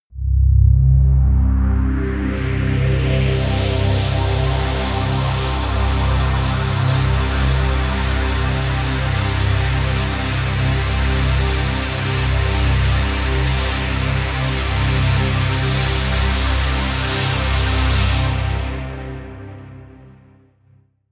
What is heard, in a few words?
pad sweep wind; flaechen